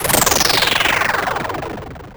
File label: mechanical noise